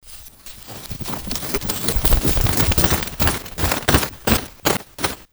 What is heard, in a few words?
PANIC SIX, Disjointed Textures; USB Soundscan vol.33; AKAI; CHAOS